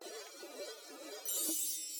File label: Spell Casting